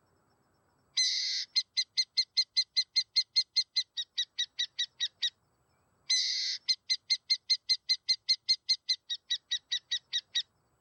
Canto da Coruja Buraqueira

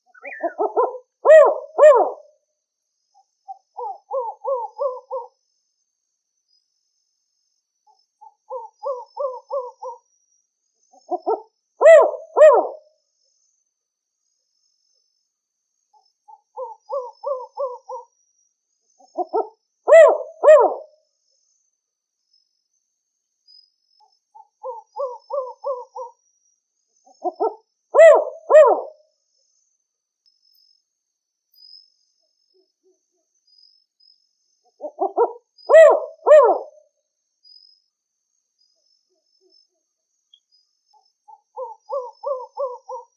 Canto da Coruja Preta

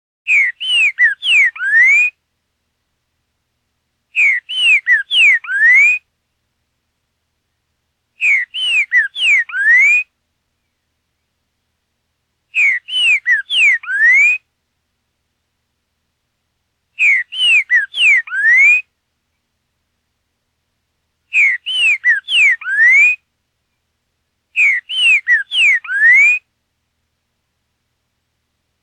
Canto Trinca Ferro Boiadeiro

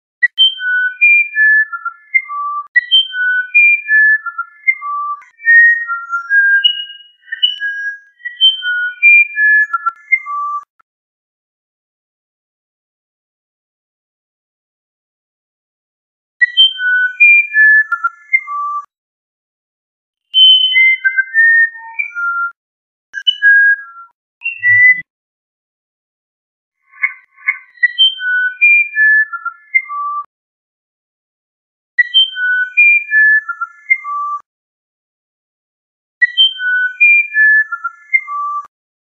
Canto do Uirapuru Verdadeiro

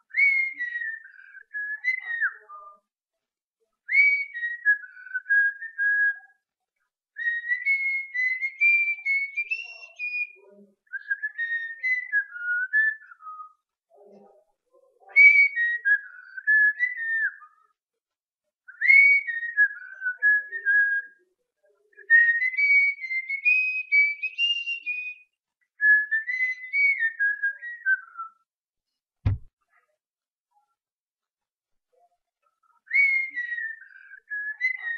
Assobio para Calopsita

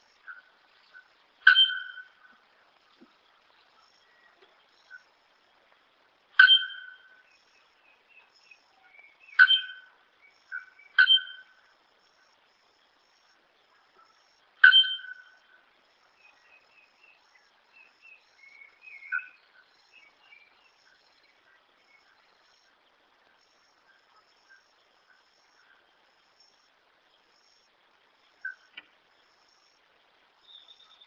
Canto de araponga

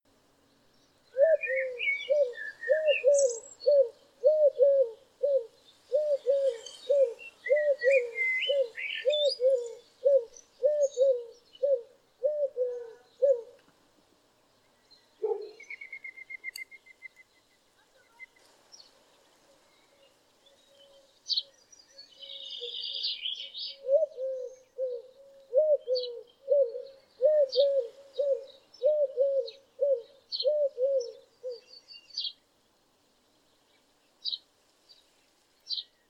Canto da rola turca

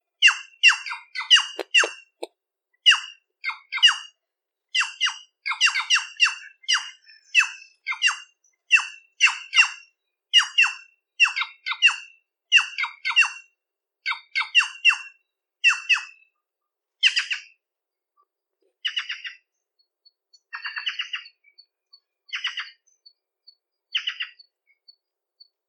Canto da Gralha Picaca

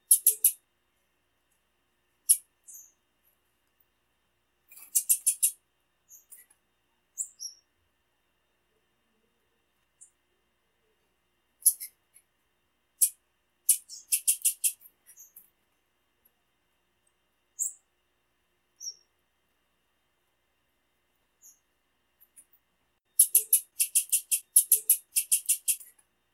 Piado de femea para esquentar Tiziu

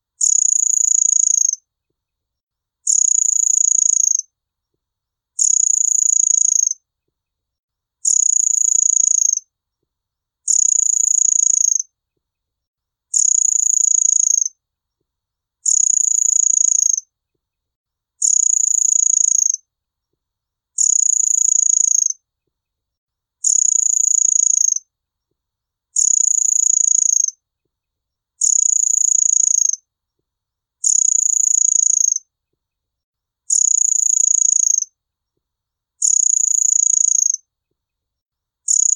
Canto do pica pau anao barrado

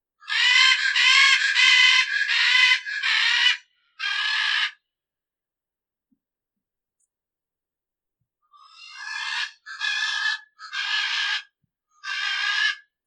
Canto da Arara Militar

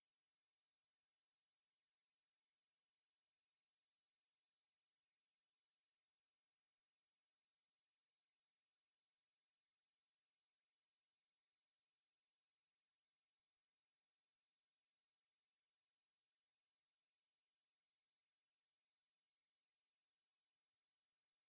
Canto do Tangarazinho

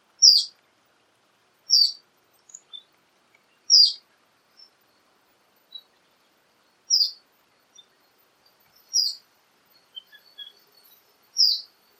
Canto do Pi pui

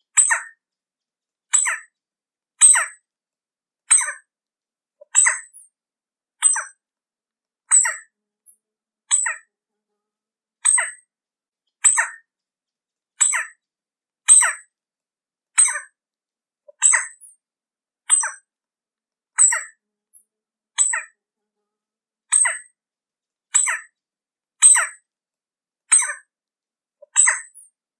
Canto de pica pau rei

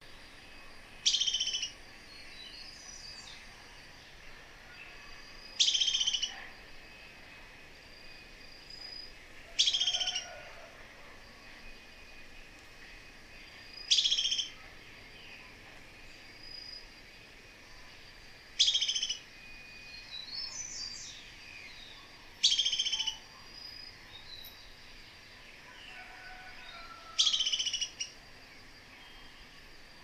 Canto da borboletinha do mato

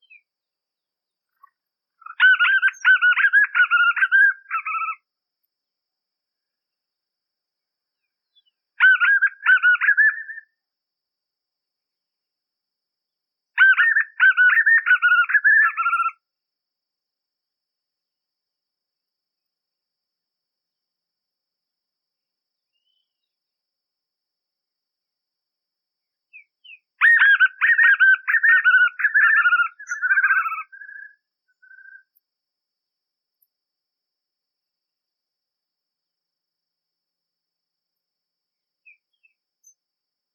Canto do joao bobo

